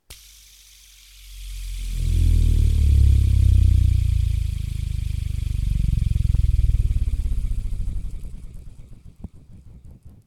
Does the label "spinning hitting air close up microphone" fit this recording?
Yes